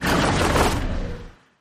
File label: skill effect